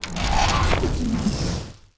onager attack

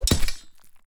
sword attack

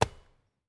lumber tree

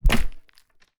pike attack

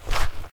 fs gravel, singlesteps

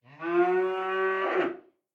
cattle; fauna

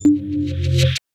alarm hero join; interface

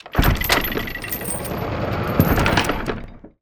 alarm ram

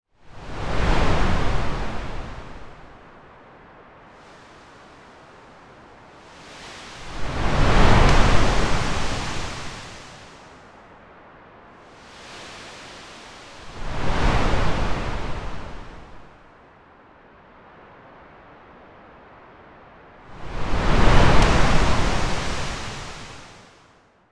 wave crash

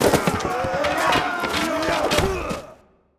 Gymnasium, completion; 0AD

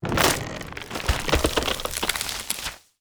lumber tree expire

